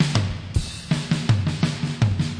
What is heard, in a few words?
big ambient fill, DRUM LOOPS and BREAKS, FILLS and ROLLS